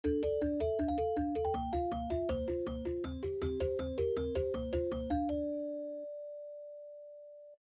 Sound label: Metal Riff; Office